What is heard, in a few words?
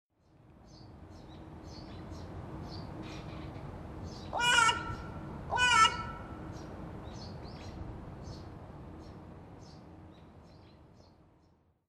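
hashiboso, Carrion Crow; sapporo; koe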